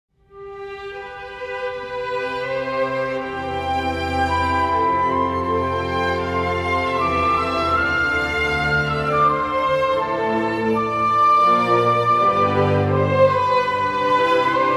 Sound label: location idyllic explore day